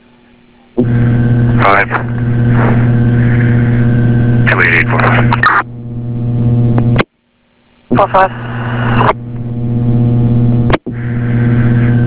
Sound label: crash